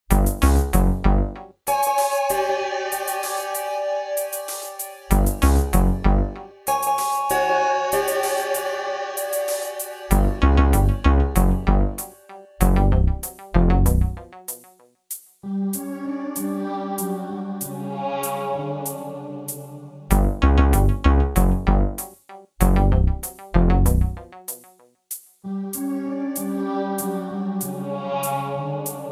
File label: Event Suspicion; Gun Gun Pixies